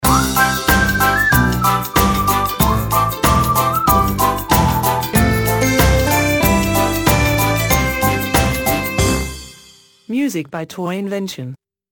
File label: Light Hearted stinger